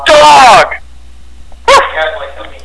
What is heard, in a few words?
dog